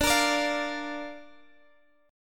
D Minor Sharp 5th D Guitar Half Step Down

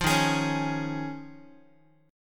D sharp 7th Suspended 2nd Suspended 4th, Guitar; strummed; 4A,4E,5A,5D,5G#,6C#; D#7sus2sus4; 8,11,11,11,x,x; JGuitar

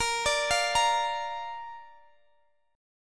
A sharp Major, down, Guitar, DADGAD

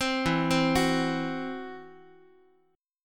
E Suspended 2nd Sharp 5th E, down, Guitar; Esus2#5 arpeggiated; x,x,3,2,4,5

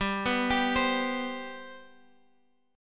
G Major Add 11th, G; Gadd11 arpeggiated; JGuitar

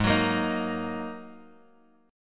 G sharp 7th Suspended 2nd; G#7sus2 Chord {4 X 4 3 4 X} strummed

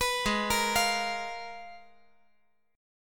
Ab Minor 6th Add 9th, Ab; arpeggiated; JGuitar

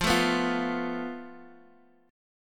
F Major Flat 5th, Guitar, Half Step Down

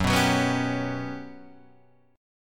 G Major 7th Flat 5th F sharp

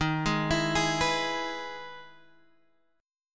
E Major 7th D sharp, chord

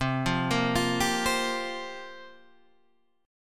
C 7th Suspended 4th, Guitar, Half Step Down

